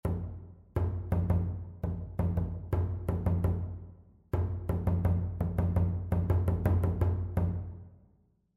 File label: rhythmic error detection